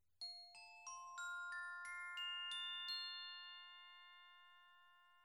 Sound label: Glock